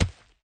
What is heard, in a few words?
symbol stop on ground; eldorado